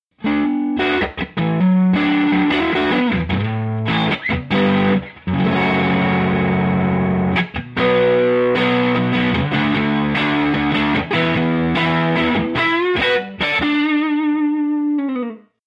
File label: overdriven